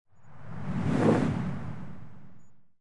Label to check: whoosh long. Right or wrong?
Right